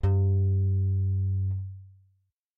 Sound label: g1 bass; matching game